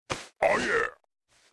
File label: blue Win Elbow; wild worlds